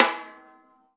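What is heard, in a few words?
RAW snare drum, Drums Acoustic; AKAI; 90s sample CD; USB Soundscan vol.10; RAW KIT 2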